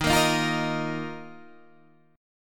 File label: D sharp Suspended 2nd